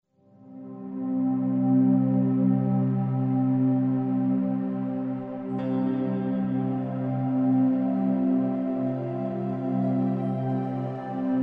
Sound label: Steinway Piano, Ethnic Flute, Ambience; Musical; Ambient SoundScapes